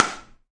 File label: dasani, Dasani Kit, ETHNIC and WORLD PERCUSSION